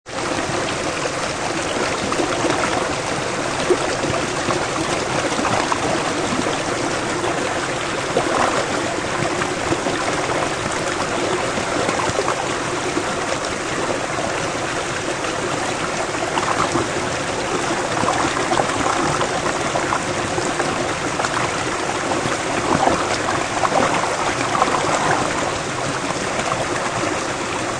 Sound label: Mountain Stream Rush, High quality upclose recording of rushing mountain stream in winter; Rivers